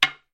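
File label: spear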